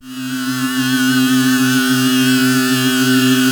Moog, Moog modular; Greytsounds VOL-1

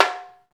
Tambarime; Club-50 - Foundations Roland; Exotic Drms

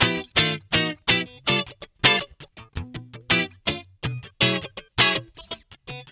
GUITAR

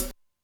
Digital, Alesis D4 drum machine

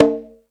Percussion, Drum Machines

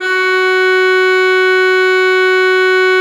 F4, Cathedral Organ; ILIO; Propeller Islands

